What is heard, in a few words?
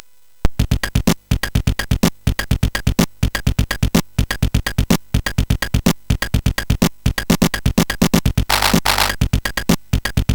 Drummy Beat, MOS6581R4; hvsc